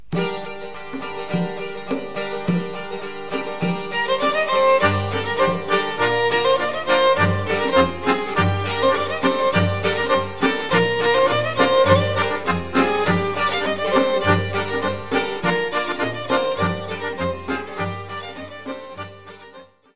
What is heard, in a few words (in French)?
Ikariotikos, Grece